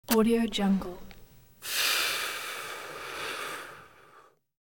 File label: cigarette inhale and exhale; hollywoodedge